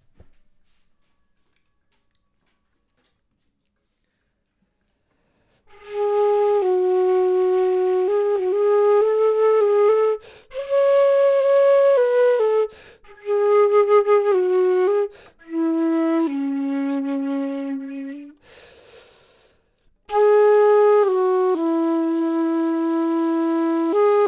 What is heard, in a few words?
flute; logic